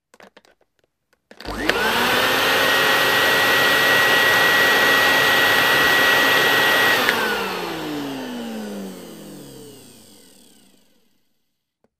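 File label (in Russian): пылесос включение и выключение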